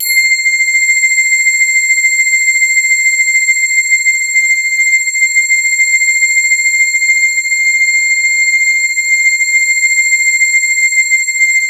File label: BELL EPIC; MORPHEUS